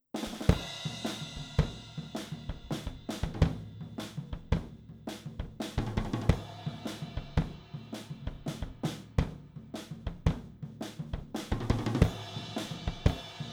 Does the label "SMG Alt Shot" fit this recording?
No